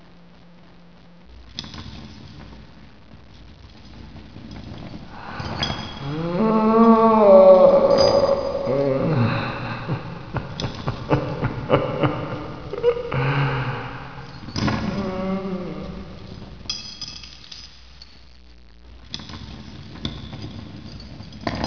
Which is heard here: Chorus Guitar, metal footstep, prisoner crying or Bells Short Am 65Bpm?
prisoner crying